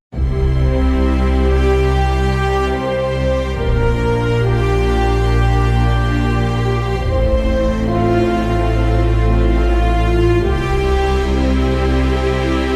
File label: stinger; musicfox